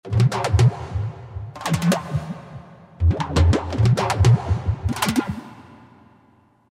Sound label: Filter Perc; World; Latin; Roland SR-JV80-18 World Collection Latin